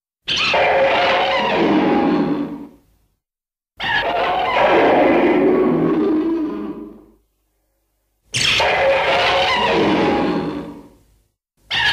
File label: Rodan Roars